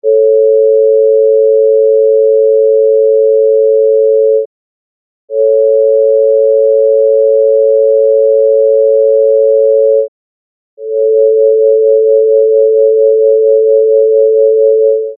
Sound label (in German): Terzen